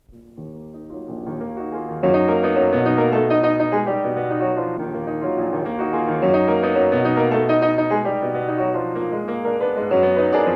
Preludes for piano, No. 14 in E-Flat Minor; Scriabin

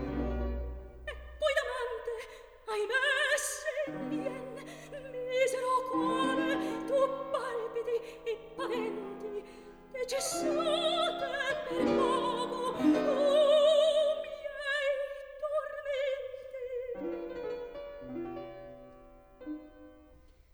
opera, Recitativo

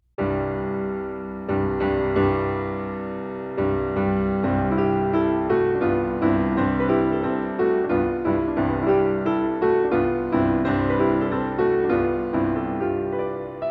Mazurkas for piano, No. 2 in C; Chopin

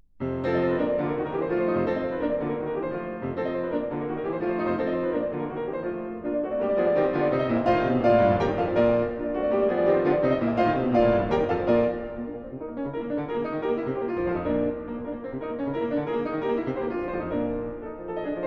Carnaval for piano; Papillons; Schumann

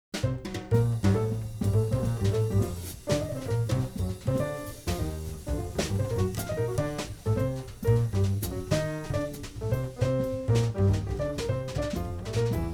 jazz ensemble; The Telarc Collection, Volume 6

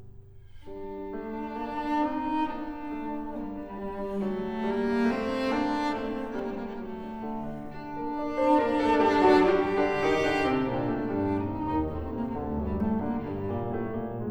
Variations for cello & piano in G major

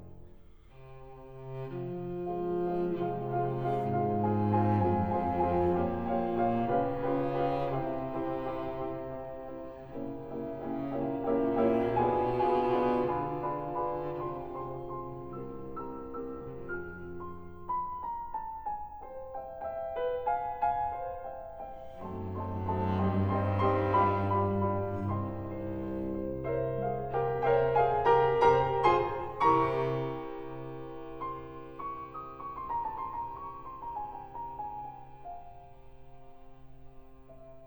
Variations for cello & piano in F major

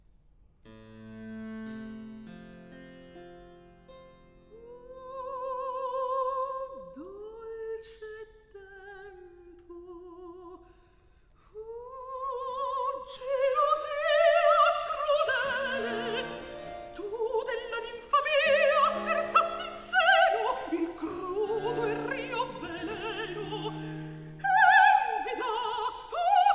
cantata, Recit; Questo è il piano; Oh, dolce tempo!; Stabat Mater: A Tribute to Pergolesi

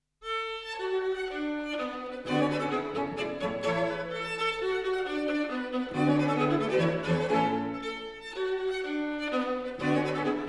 String Quartet in A major, Rondeaux, Allegro; Mozart

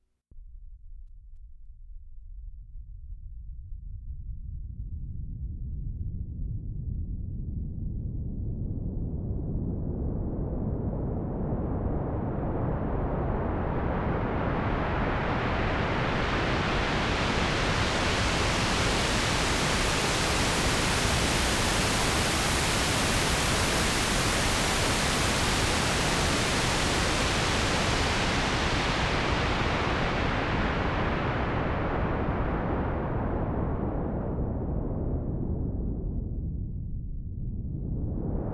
Equipment and Cable Burn-In Signal; The Best of Chesky Classics; Jazz and Audiophile Test Disk, Vol 3

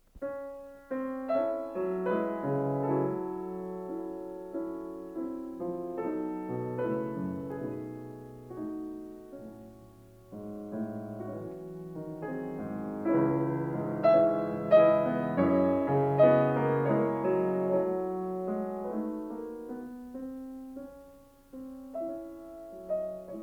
Preludes for piano, in E major; Scriabin

